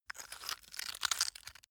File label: stone scrape hard